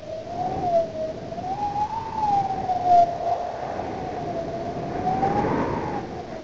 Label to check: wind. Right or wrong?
Right